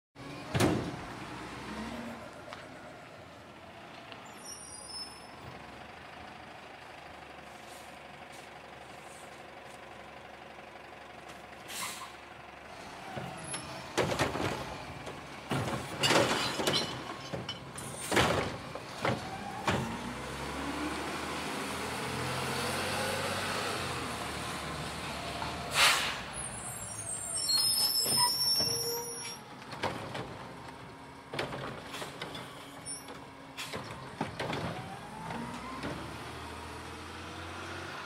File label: Garbage Truck